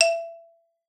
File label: E4, xylophone; Xsample VOL-4